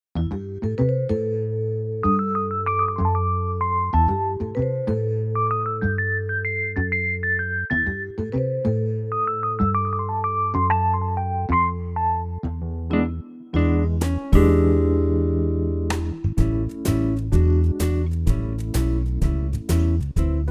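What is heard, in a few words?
A, backing track; key - A - vocal range - A to Eb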